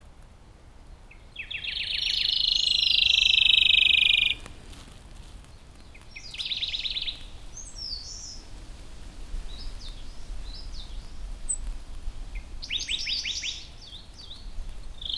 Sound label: robin rufous tailed Larvivora sibilans